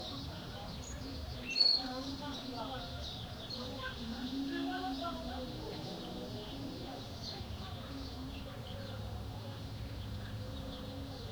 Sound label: morning ambiance in a col, kids playing